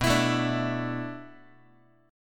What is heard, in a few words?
B Minor 11th B down Guitar 4A 4E 5A 5D 5G sharp 6C sharp; Bm11 strummed; JGuitar